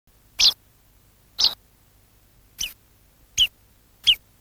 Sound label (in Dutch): duinpiep, trekroepjes